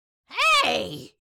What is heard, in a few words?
Witch fantasy vocalization short shout